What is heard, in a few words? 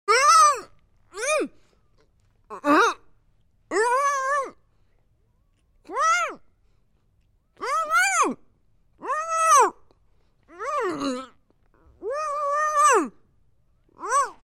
Gagged Woman Struggles to Yell Through Taped or Covered, Human Sounds